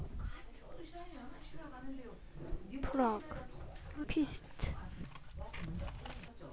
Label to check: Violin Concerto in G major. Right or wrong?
Wrong